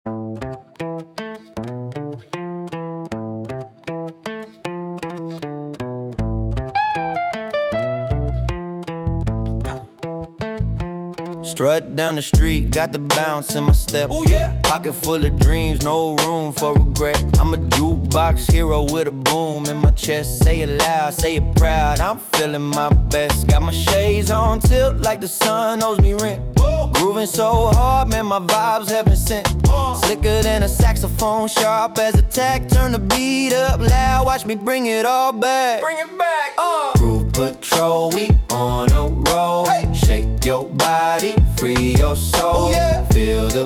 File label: groove